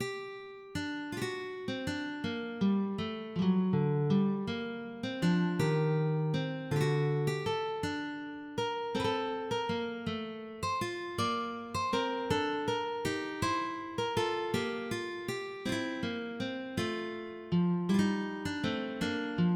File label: Gigue